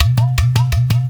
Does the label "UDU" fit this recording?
Yes